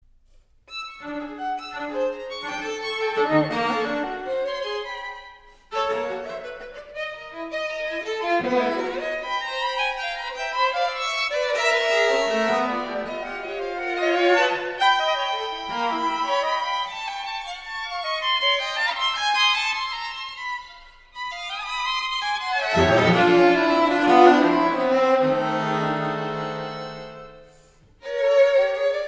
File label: String Quartet, Moderato